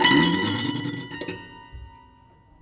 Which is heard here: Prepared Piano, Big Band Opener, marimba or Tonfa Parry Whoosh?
Prepared Piano